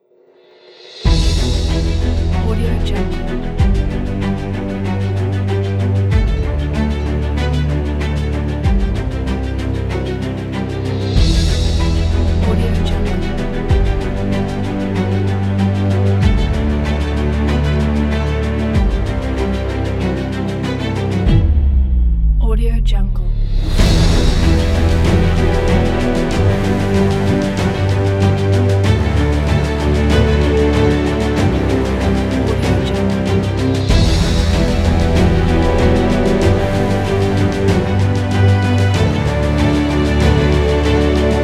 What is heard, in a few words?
action epic trailer